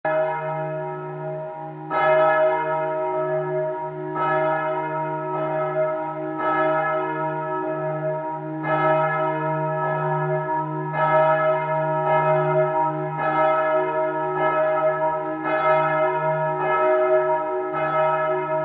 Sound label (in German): Glocke; dom st martin rottenburg